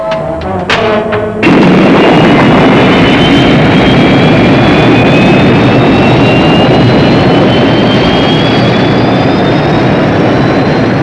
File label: Liftoff